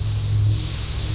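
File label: levitate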